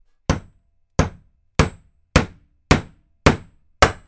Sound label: Hitting Nails With Hammer